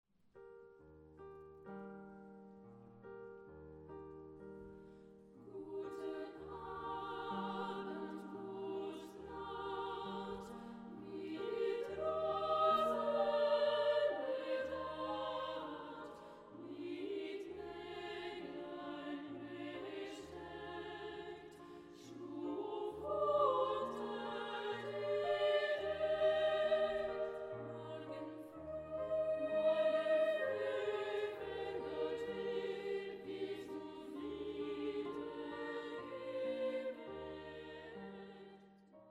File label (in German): Wiegenlied